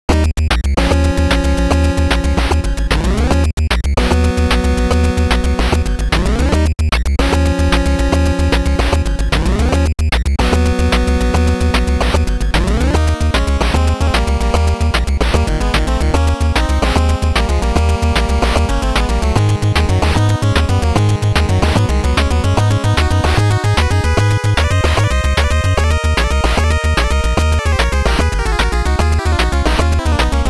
BGM; Al Unser Jr. Turbo Racing; NES

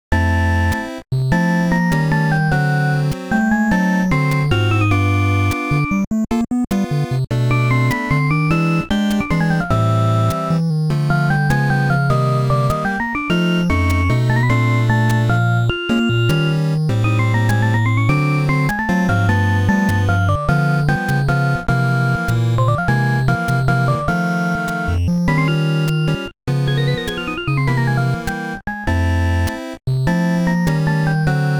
Staff Roll; Exciting Billiard; FDS

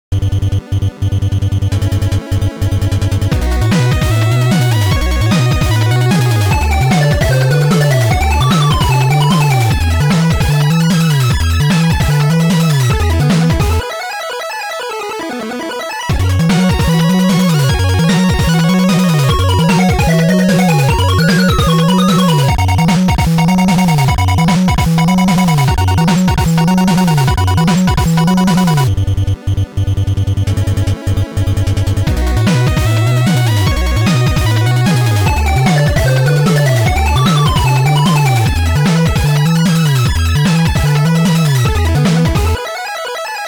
Device Completed; Silver Surfer; NES

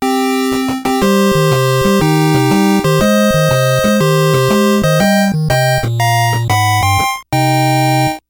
Game Over; Dick Tracy; NES

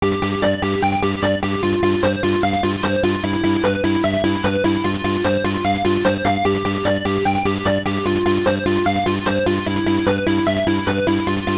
GB Unused Track; Mega Man IV

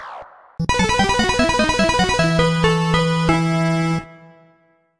Game Over; Dracula 2 - Noroi no Fuuin; FDS

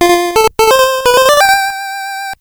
Hole Theme, NES; Bandai Golf Challenge Pebble Beach

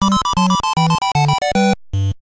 Game Over; Tetris Tengen; NES

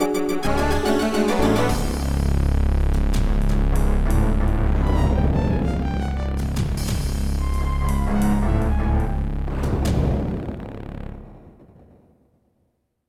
Death; Jagged Alliance; DOS

